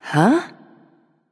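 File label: confused, female; smite; voicepack; hera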